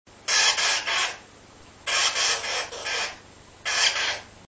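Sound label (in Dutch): jong 3 weken, agaporniden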